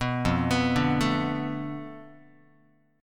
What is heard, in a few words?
F 6th Flat 5th F down Guitar, chord; F6b5 arpeggiated; 2,1,2,0,1,x